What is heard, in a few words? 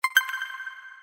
crash count